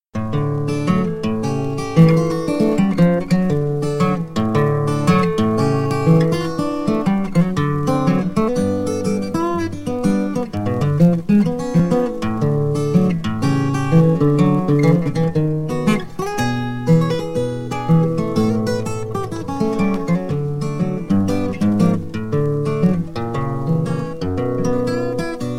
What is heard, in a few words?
Ton Of Blues, ragtime guitar; how to play ragtime guitar